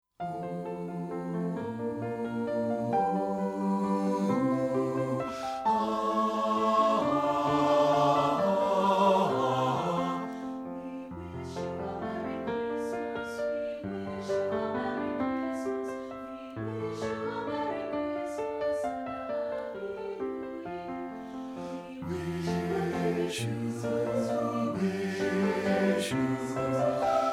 WE WISH YOU A MERRY CHRISTMAS; partbypart

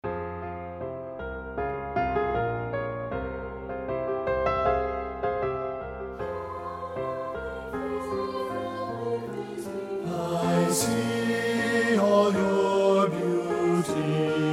BASS

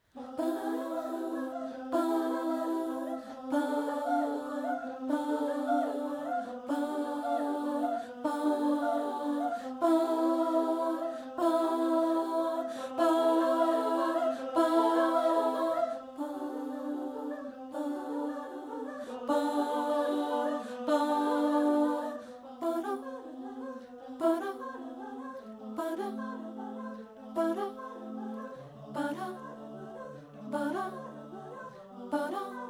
ALTO; satb

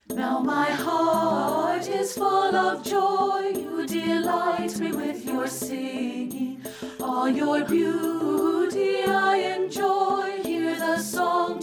ALTO; 3partmixed

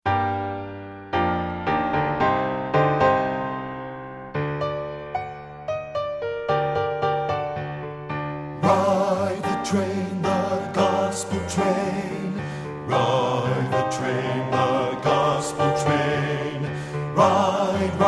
Ride the Gospel Train BASS, satb; partbypart

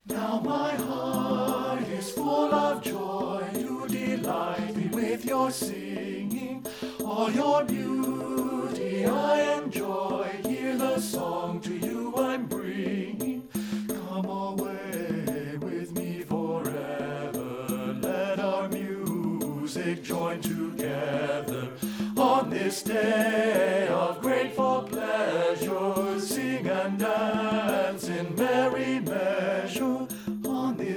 BASS; 3partmixed

